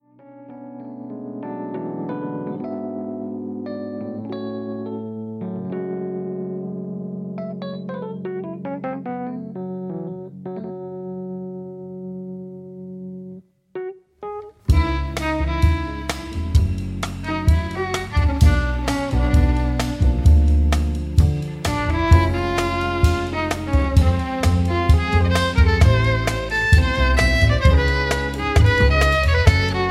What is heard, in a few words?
ballad